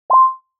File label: Arp; Super Metroid